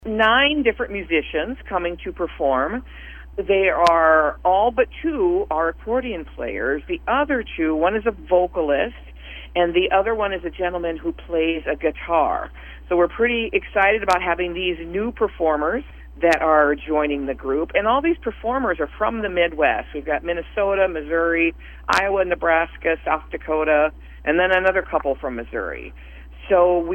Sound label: Accordion festival; historical museum hosting annual accordion festival